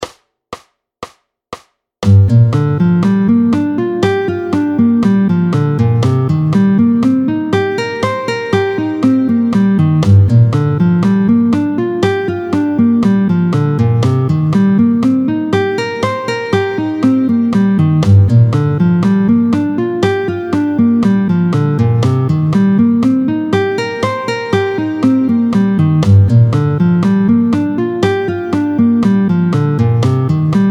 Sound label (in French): Arpège mineur 7 Solm7 Dom7. Vite, 120; arpèges au médiator; Les accords mineurs 7 Solm7 Dom7; Exercice chapitre 8 page 40